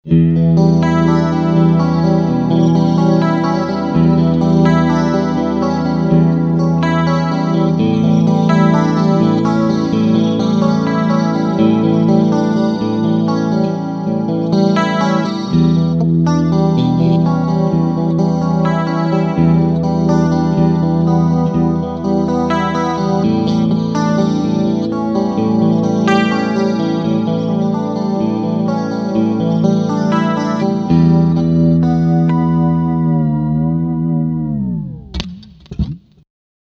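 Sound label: Clean Singlecoil Humbucker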